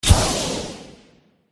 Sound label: eldive boss attack contact bottom